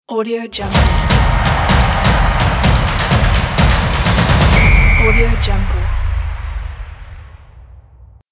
sport logo drums

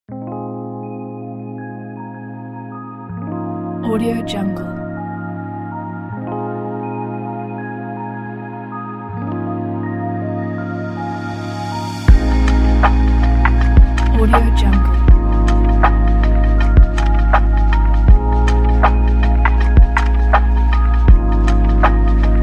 dreamy chill hiphop

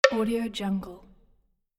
woodblock; bohdanshoshyn

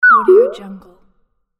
bird whistle message

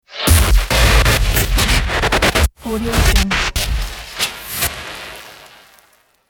noise glitch interference

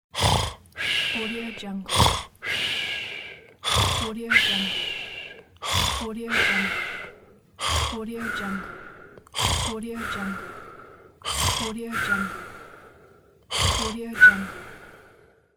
snore

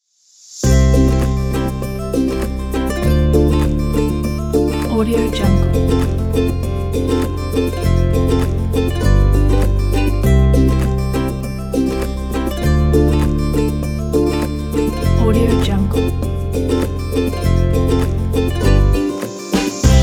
uplifting folk kit

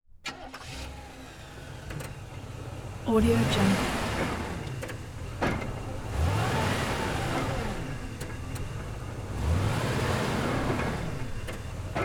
chevy pickup truck start idle stuck wheels; 1988; prosoundfx

